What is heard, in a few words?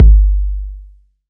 fluffy kickdrum sample g key